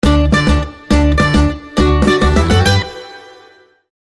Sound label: Tarantella Allegria